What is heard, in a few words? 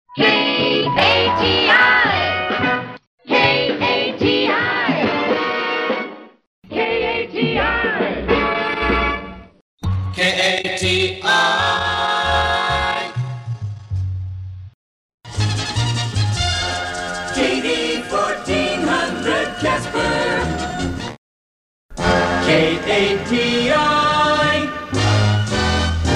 Jingle Package; KATI